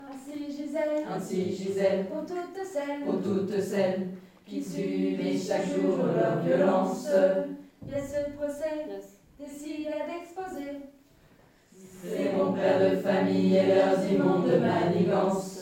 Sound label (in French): Bons peres basse refrain; bons-peres-de-famille; Feminista Coutances